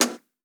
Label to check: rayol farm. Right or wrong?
Wrong